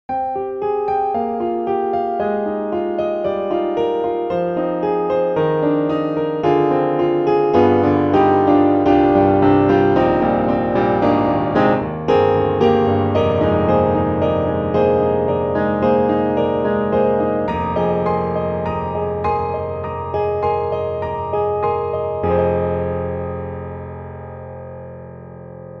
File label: Pianoteq Grand C2 medium; MODARTT S.A.S.